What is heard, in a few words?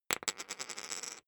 poker chip fall wobble